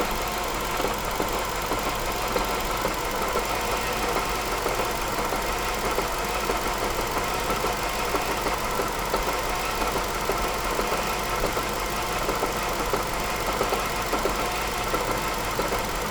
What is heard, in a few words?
frozen engine; XJ XJ6 XJ8 XJR X350 X358